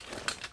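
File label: footstep equip movement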